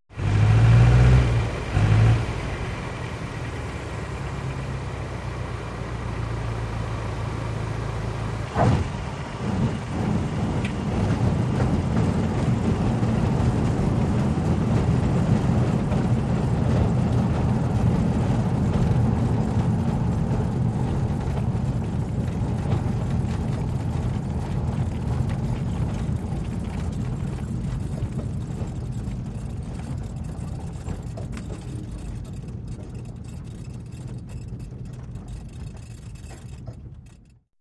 Banging And Squeaking As Plane Taxis Over Ground, With Engine At Very Low Sputtering Idle. Engine Off With Squeaking Sound. Close Perspective; Taylorcraft